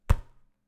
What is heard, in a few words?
Clothes impakt; Gods of Akragas